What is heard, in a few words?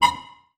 Multimedia Internet CD-Rom Flash Blast